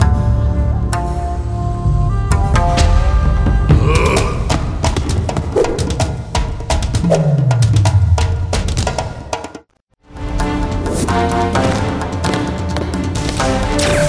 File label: Intro Monastery Laser; KickBeat